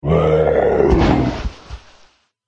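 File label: hydra death; gidra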